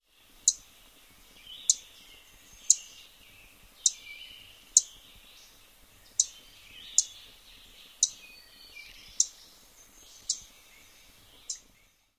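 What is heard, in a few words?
alarm chips, bird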